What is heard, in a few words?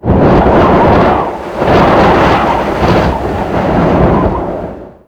Mountain Pass In Ear Wind Gust; Ambience